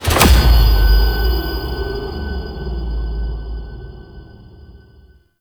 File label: Struggle Prompt Open; Warframe; The New War